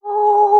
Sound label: ooh, Voice